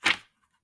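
this is sound of page turning made by hitting book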